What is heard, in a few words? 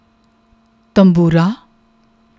tamboora, musical instruments